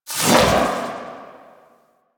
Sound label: Doom Sword Whoosh; Warframe; Excalibur